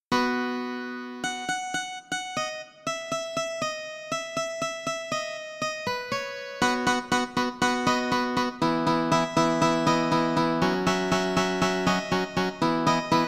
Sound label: Midi; Dodge Viper